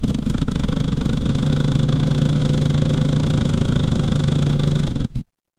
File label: mechanical engine